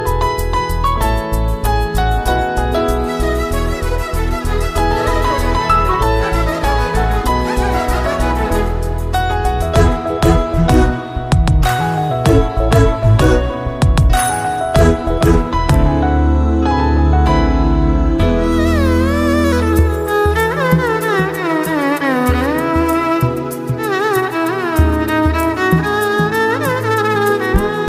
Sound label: piano